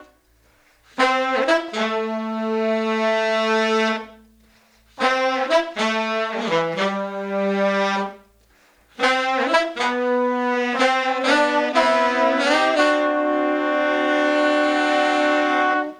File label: Horns, 70s Analog Funk; Sonic Foundry (Sony Creative Software); What it Is!; Classic Caddy